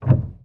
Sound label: piano release